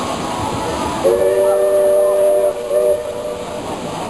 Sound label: train whistle